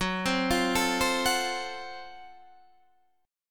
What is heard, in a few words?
Gb Minor Major 7th Flat 5th Gb Guitar Open D